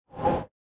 Swoosh

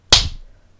Clap

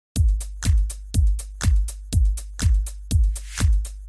Dance Beat

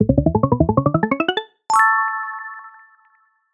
Timer complete

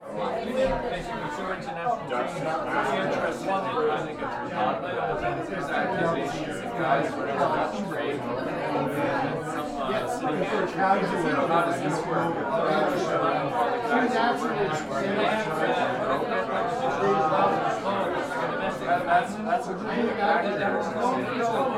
House Party Inside Voices Talking

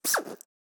Lazer Sound

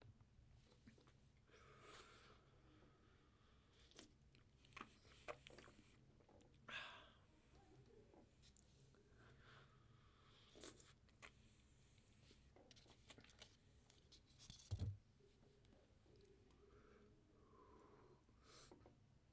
Drinking

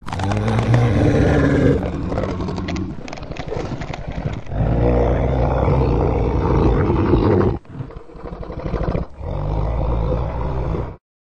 Dinosaur

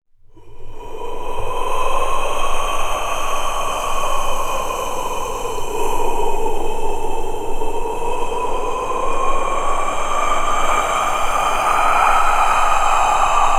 Wind Voice

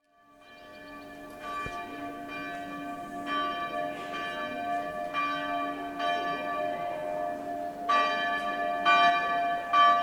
Rocamador church

